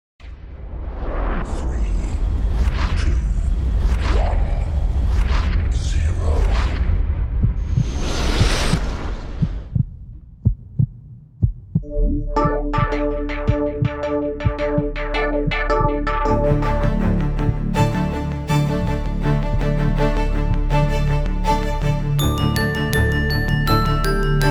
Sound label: Musicbox